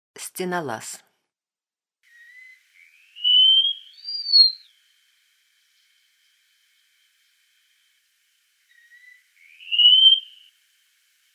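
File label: stenolaz golos, wallcreeper voice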